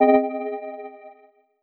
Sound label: button